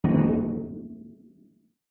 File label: impacts metal thud clunk heavy solid designed